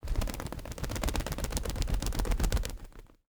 PIGEON, avian, bird, Medium, organic, fast, sustained, short flight, fast; pass by; Wings; Bonson